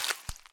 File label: Mud Squith; DesktopGoose v0.3